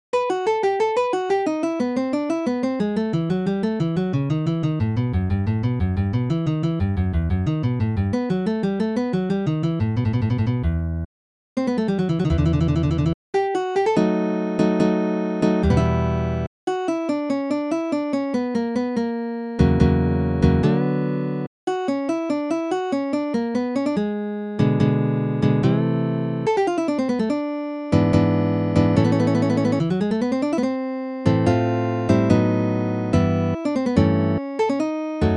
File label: bach lute suite no1 prelude, guitar